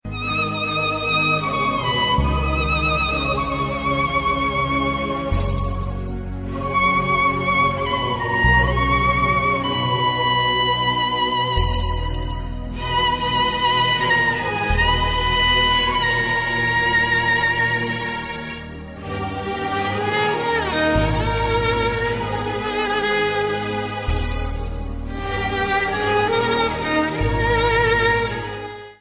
sad violin ringtone

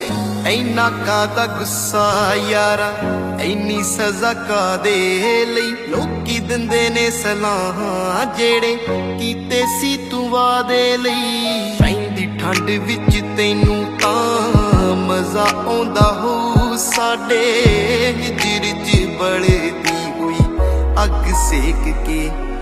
punjabi heart touching ringtone

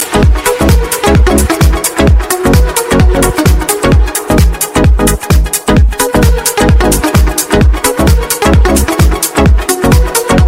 slow motion ringtone

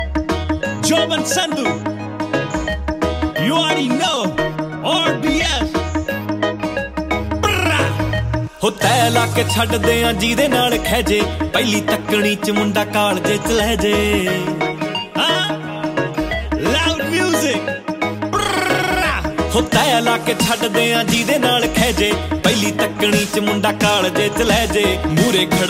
ringtone

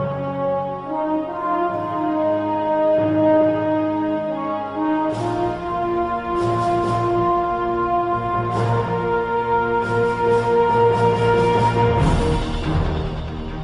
english love ringtone

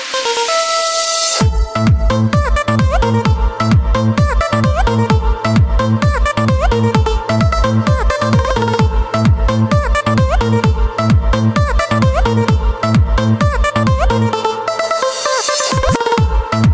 ringtone beat; galaxy ringtones

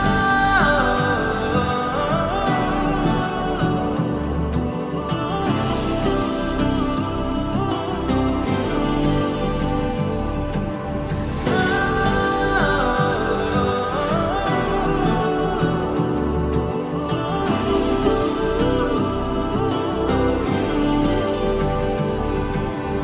hindi ringtone

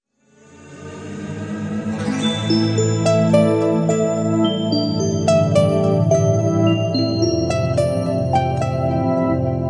music sound ringtone; galaxy ringtones

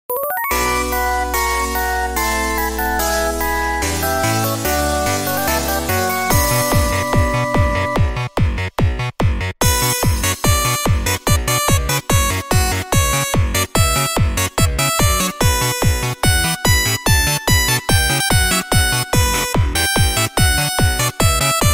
electronica game music call tone

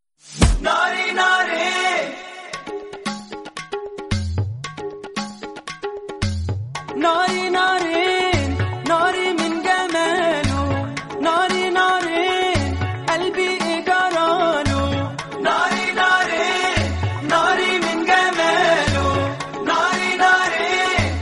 arabic song tone ringtone

